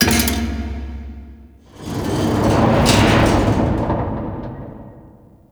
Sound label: Pendulum; Ambience; Props; Warframe; Entrati